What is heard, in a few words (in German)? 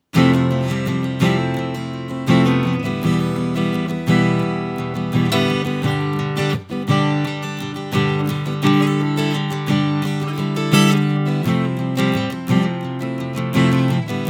Strumming mit Mikrofon; PRS SE A20E